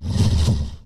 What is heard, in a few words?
lurk, Angry Chimera Growls; TBs Angry Chimera Growls